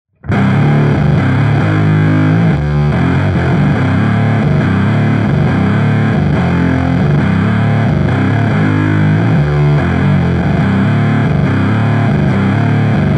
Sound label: darling fuzz darling storm